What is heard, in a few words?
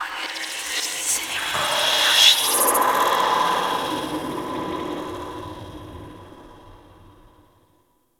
Corrupted Artifact Random; Ambience; Props; Warframe; Orokin Derelict